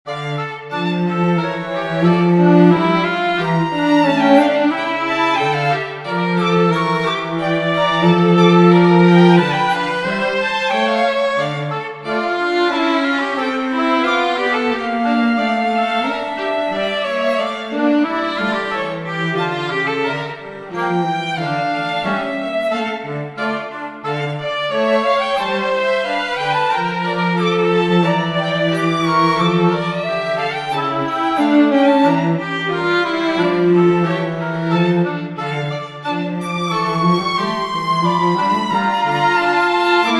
Canon Cancrizans in D minor for String and Woodwind Trios